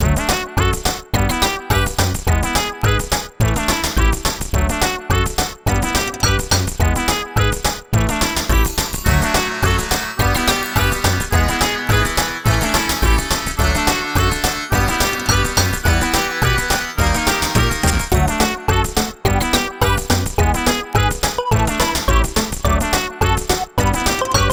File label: Glitzville, Chinese-style city; PMTTYD